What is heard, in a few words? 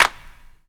CLAP, Dance Drums